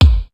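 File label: flange kick; sr16 bd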